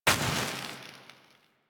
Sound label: Enemy Burn Death; Warframe; Caliber Chicks minigame; weapons; hit effects